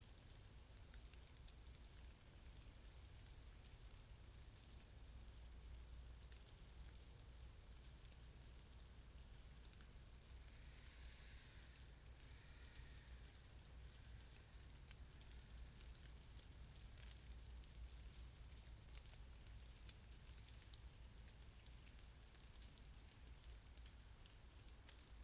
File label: yamanakako sound